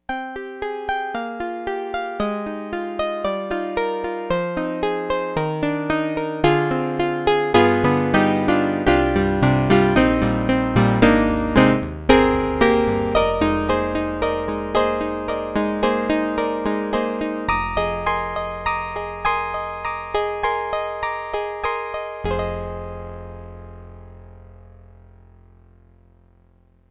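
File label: Roland D-110 Acoustic Piano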